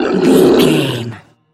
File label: troll monster death slow